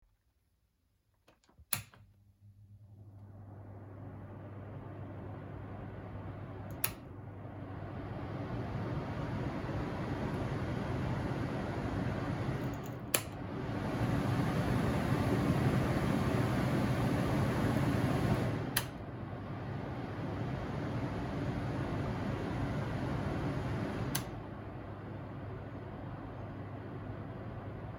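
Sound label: kitchen extractor hood all modes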